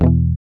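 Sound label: GUI E, acoustic guitar; Best Service Gigapack 2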